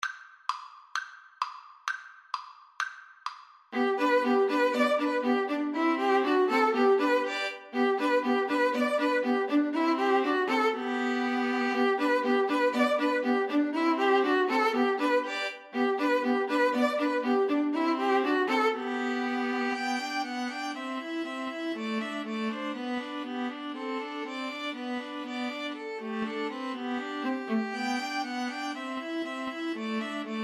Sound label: ecossaise, String trio